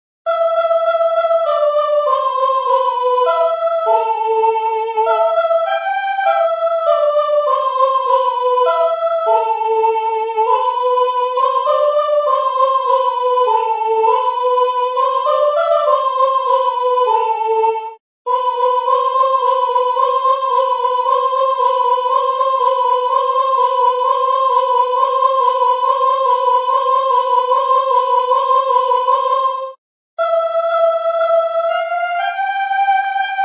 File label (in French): soprane; vo pole